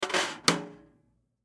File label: Drum Snare; Snare 14 Inch Pacific Throw Snare On; Drum Kit; Blastwave